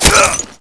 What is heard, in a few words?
axis die; dod